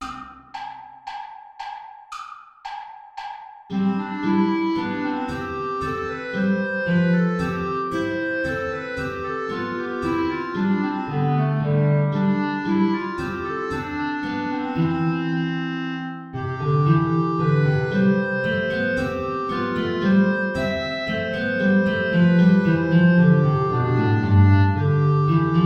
air play along without contrabass; bach johann sebastian

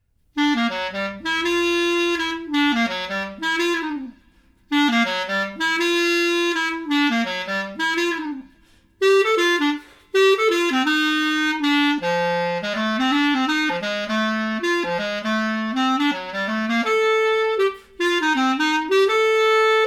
waltz; two steps

